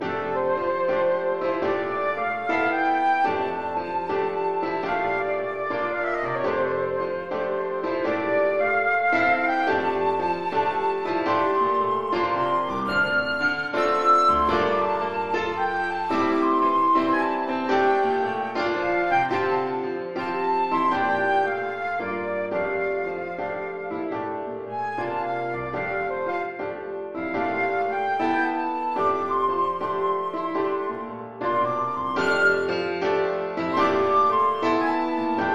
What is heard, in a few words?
Summer Swing